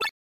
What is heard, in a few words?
total Win Countup End; reel rush